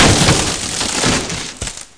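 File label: FENCE HIT; mtm2demo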